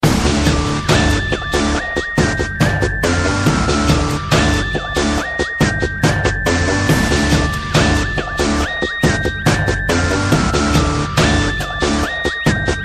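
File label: Organ Pad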